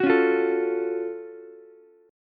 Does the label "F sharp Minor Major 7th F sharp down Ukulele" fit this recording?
Yes